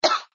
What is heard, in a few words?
Cough; Lewd SFX